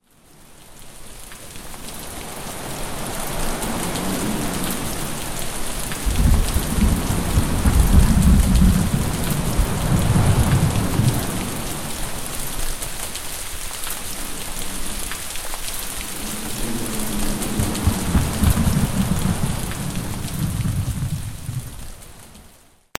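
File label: severe thunderstorm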